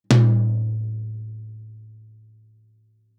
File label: Toms; Touch Loops; CosmicFunk